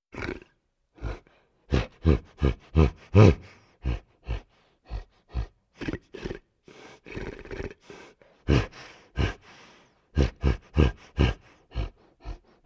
Dog Growling

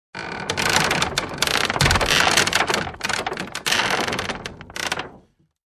Loud wooden equipment Creak

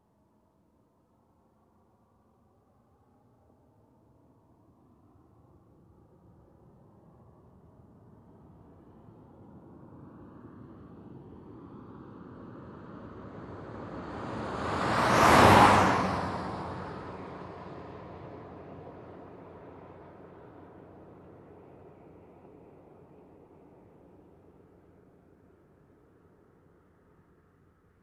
Car driving by at medium speed

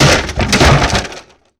Destroying Woods

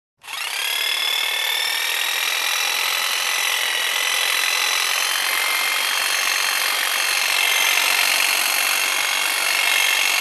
Hedge Clipper

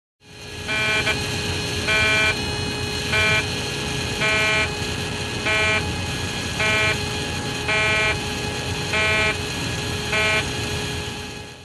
Cockpit Ambience with beep

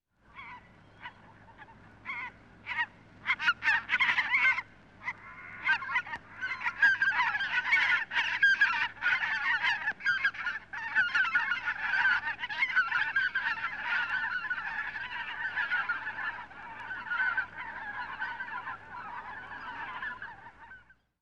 Ducklings clucking

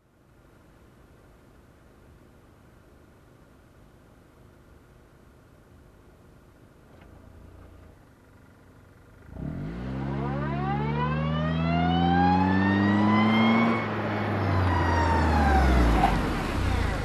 Car backing up and parking nearby

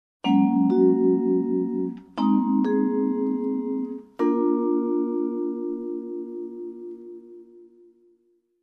Vibraphone ascending resolving chords

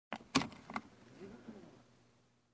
Movement in car interior

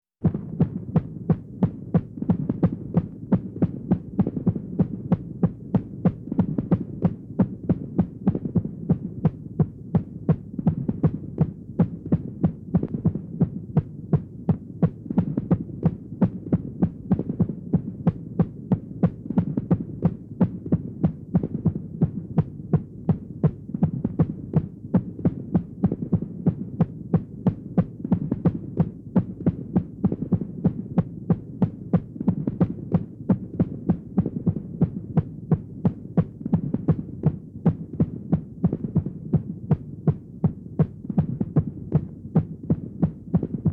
Multiple Drum beat like sound distant

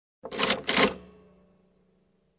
Typewriter reset

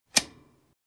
Clock tick

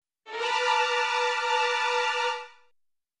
Suspenseful vintage orchestra holding tension

